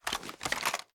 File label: drop down, reloads; gmod